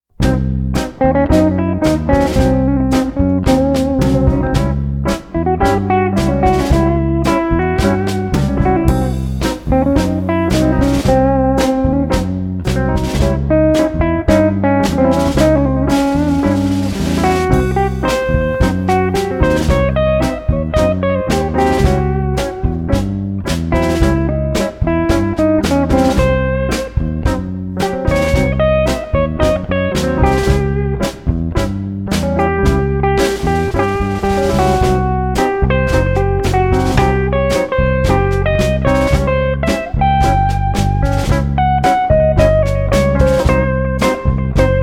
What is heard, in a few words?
istrian